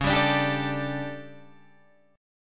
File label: D Major 7th Suspended 4th, chord